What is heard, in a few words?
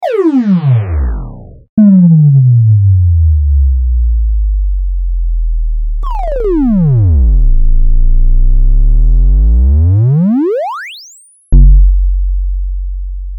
Bottom End